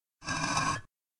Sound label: Medium Rumble Walls; SyrinScape; Hunting horror battle